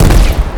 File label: explosion